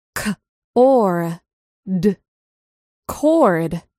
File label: chord